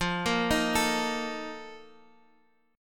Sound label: Bb Major 7th Suspended 4th, F, chord